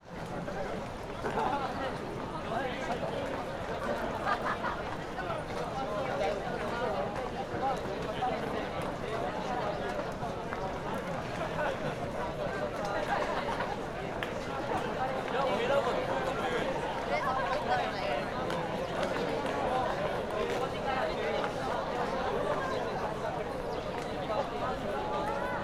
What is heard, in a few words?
graduation ceremony